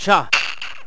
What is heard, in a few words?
horseman attack; megaglest